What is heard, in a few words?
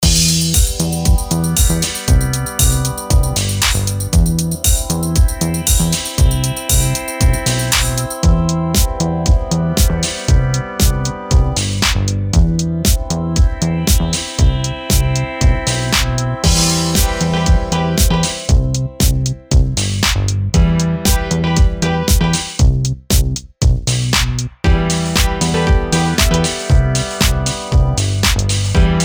beat; bpm:117